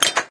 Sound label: gun hide